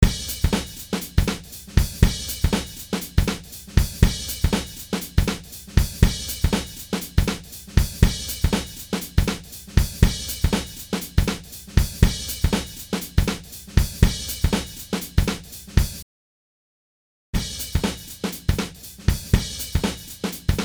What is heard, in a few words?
Drums; 33609DiodeGlueSound; PassiveTubeHiSheenSound